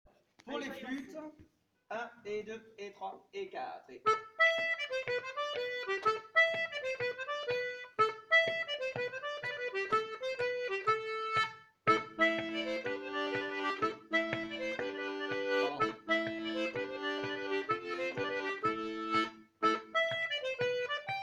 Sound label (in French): voix 2 flutes; Megastive; Cercle circassien